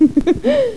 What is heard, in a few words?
GIGGLE; Carmageddon